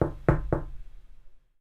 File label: door knock